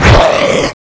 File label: king zombie attack; incredi pack horror